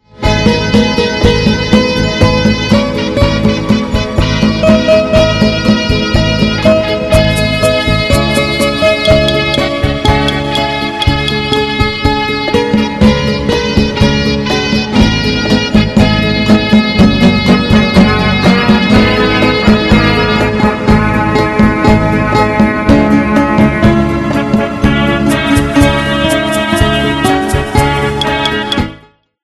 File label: slow latin melody